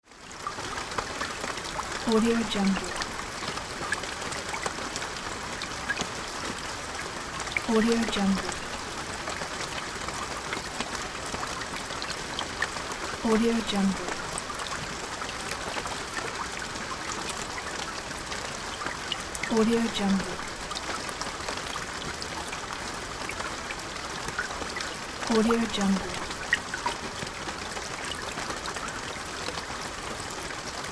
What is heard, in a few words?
soft rain sounds